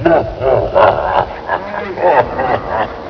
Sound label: JABBALAF